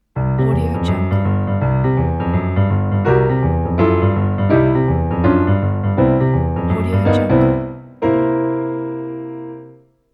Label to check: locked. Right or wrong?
Wrong